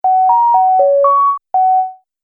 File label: Multimedia Music; Miscellaneous Musical Sound Effects